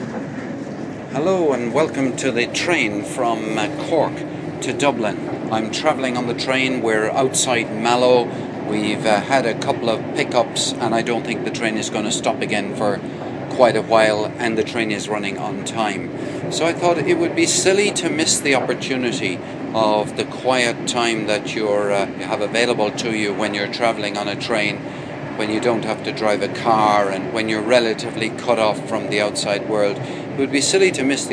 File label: taking the train from cork to dublin